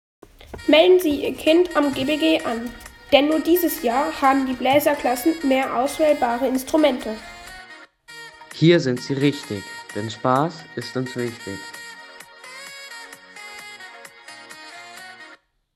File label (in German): Musik; GBG Werbespots